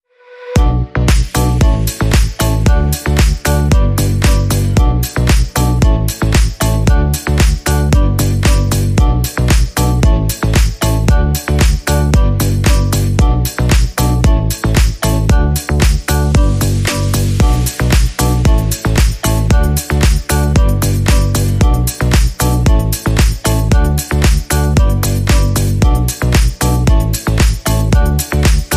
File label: Pina Colada Chords Rhythm